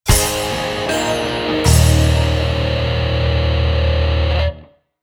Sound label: End Tag Full Sitar No Piano Light Bass